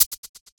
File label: Shaker, techno drum